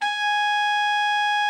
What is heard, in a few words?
TENOR, Sax; Giga Samples Collection